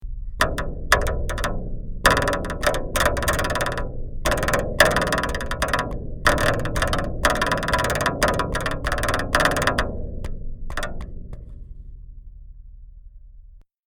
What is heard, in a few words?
Stretched Spring; 3D-Printed Spring Reverb Microphone